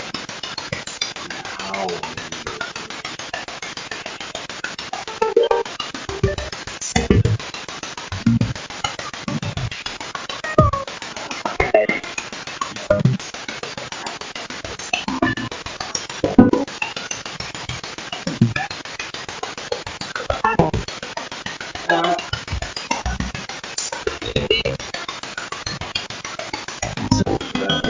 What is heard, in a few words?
2nd Floor Middle Room; wexford general store antique shop return investigation